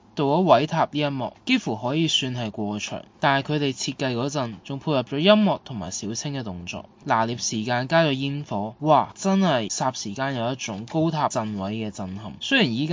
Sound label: audience scene; stage musical 80s 1982